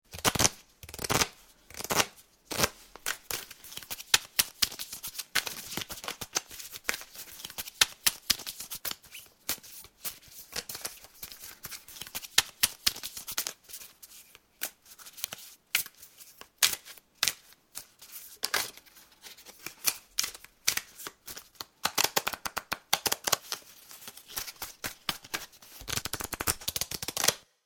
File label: Shuffling Cards, Shuffling a pack of playing cards.; Shuffling (Sound Effects:Objects)